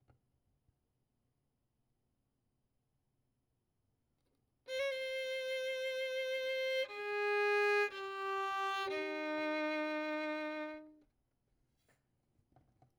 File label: Violin; Verdigris Music